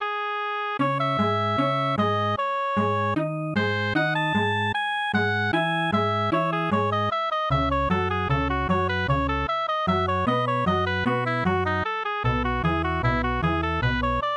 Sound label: MIDI